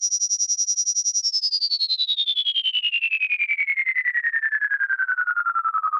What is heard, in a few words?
pitch down